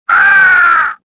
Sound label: death, swamp; swamp2; Star Wars Jedi Knight II Jedi Outcast; XBOX